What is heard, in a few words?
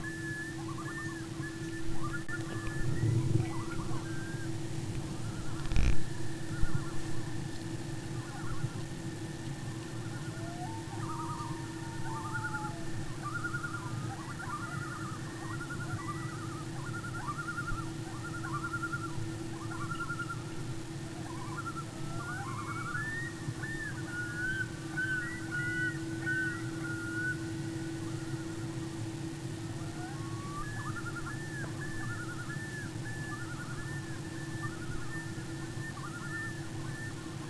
LOON